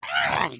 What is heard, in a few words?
flail; creatures organic